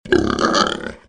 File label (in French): Cochon, Animaux; bruitage